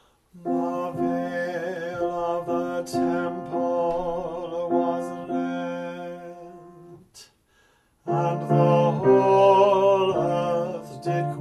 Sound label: soprano